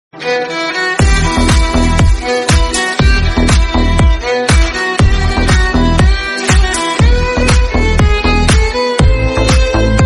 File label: lovely guitar background instrumental tone ringtone